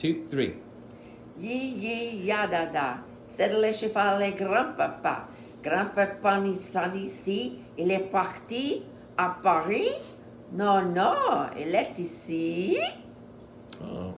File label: belgian horse song